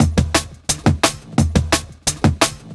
DNB BREAK

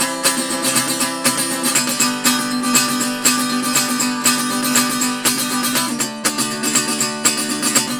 Tenor Guitar, Appalachian; Sonic Foundry (Sony Creative Software); Continental Drift Aftershocks

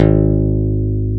G#0 pick, bass; AKAI S-Series CD-ROM Sound Library VOL-8; SET#1 BASS

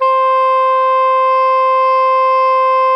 SOPRANO C 4, Saxophones; Greytsounds; Philip Wolfe David Crozier's Saxophones

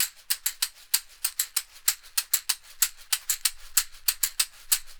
CAXIXI

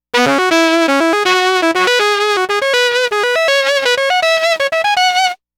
Sax Line; Synthetic Winds; Dr. Fink's Funk Factory

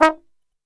D#2 XTRB SHT, TRMB SHOT; Best Service; Brass Super Section

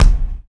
Human Perc, Body Perc

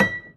Prepared Piano; Battery 3 Sample Kits

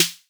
snare classic

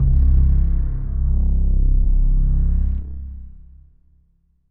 Deep Space Kick, Musical FX; Zero-G Smoke

